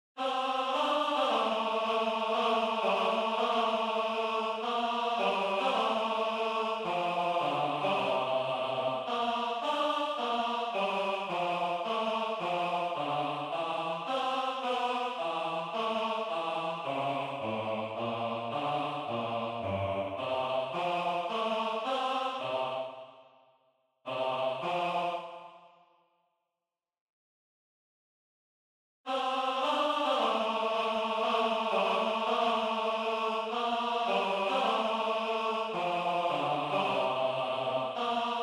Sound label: toreador tnr